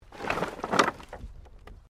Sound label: Wood Movement Minor